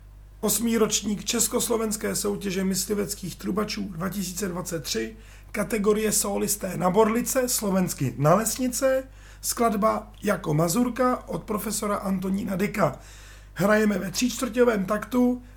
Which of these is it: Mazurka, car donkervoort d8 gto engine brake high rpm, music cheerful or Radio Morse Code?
Mazurka